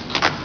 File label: Horses Menu Popup; Win95